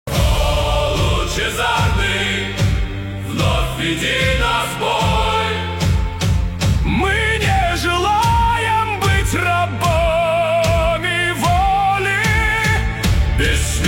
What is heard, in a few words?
epic heavy metal